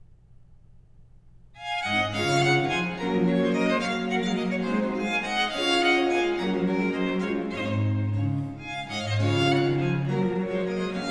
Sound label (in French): Pièces de violes, Suite in D Major; Book 4, No. 43-46; Nicola Matteis; Ayres for the Violin; Quatrième Livre (Paris 1717)